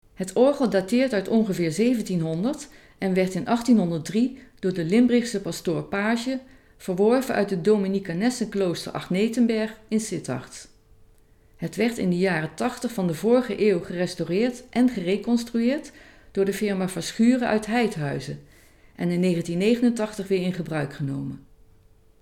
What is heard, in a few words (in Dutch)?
orgel; tour kasteel limbricht